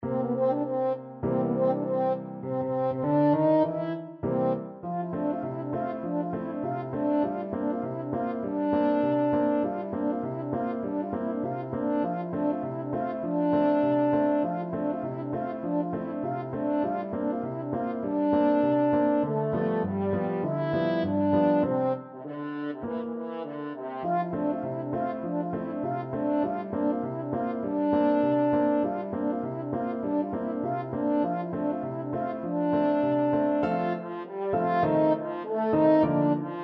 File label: french horn